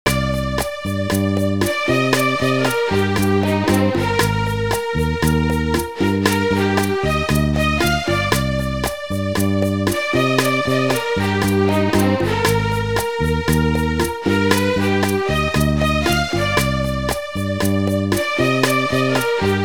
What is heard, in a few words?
bossa